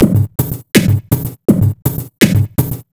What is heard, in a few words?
techno, Akai MPC2500